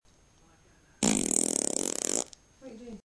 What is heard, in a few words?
fart